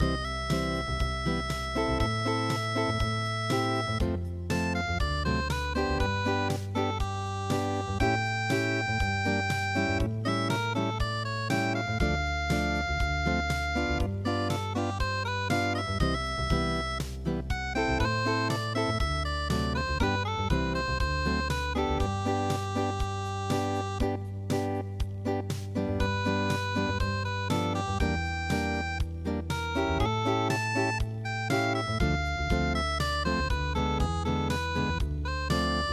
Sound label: unpluged